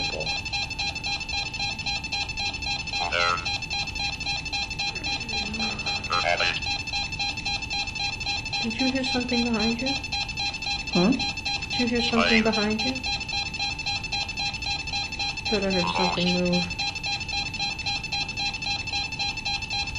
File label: moan is heard